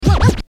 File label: scratch